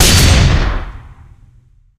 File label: shot, weapons; widow maker; tf